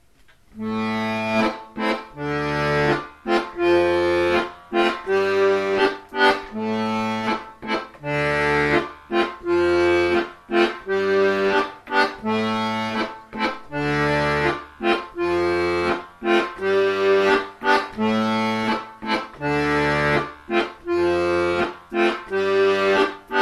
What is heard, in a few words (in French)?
Rondeau du blaireau Diato